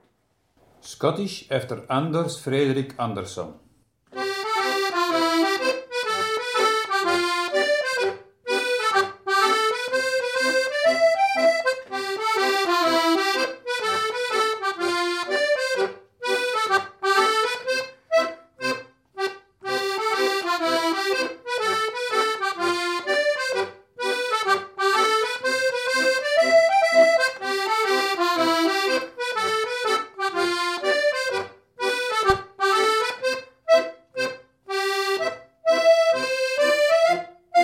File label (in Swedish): schottis; efter anders fredrik andersson